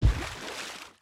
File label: landfill large; Factorio